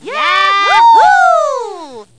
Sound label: YA HOO; Stanley's Sticker Stories (1997)(Edmark); CARNIVAL